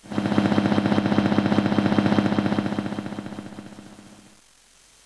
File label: Stereo Heli